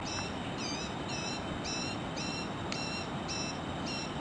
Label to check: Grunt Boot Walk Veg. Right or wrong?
Wrong